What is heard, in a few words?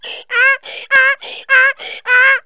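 small donkey; The Epic Collection 3; Epic Marketing; muzak samples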